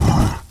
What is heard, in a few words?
rhino snort